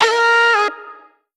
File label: Vocal one shot Bb; Slate Digital Omnivox Vocal Sample Pack